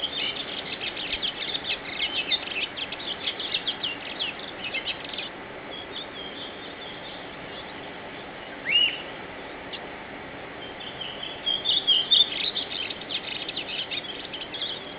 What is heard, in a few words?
redwing